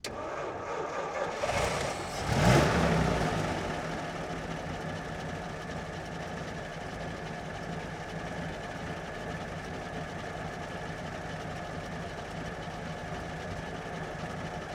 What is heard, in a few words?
1937 Plymouth Ext Start Idle Off, Period Vehicles